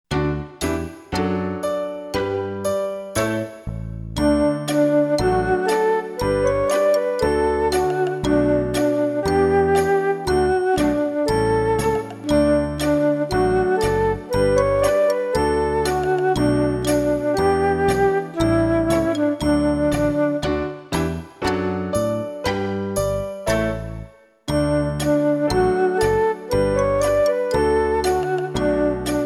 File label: Praise Him Praise Him instrumental